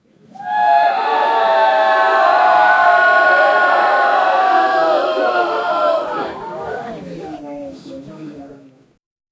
small crowd relieved sigh

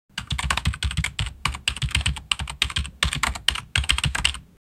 keyboard typing, office

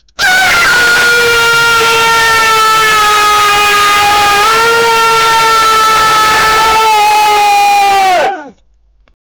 man is screaming, anguish

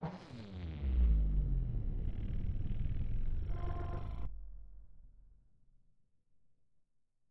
cinematic boom; explosive

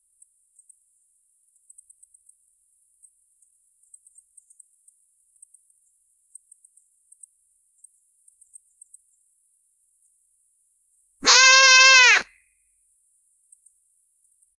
a teeny tiny mouse doing

